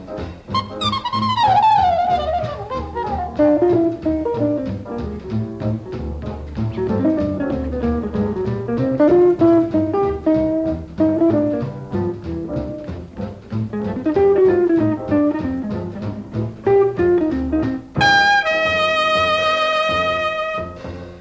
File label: solo, guitar